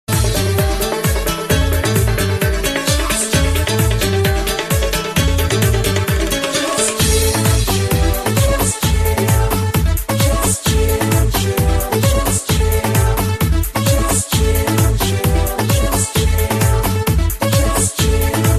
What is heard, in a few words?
chill remix tone ringtone